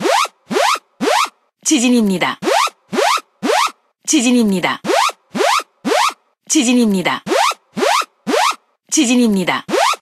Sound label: earthquake; Android; CellBroadcastReceiver; ko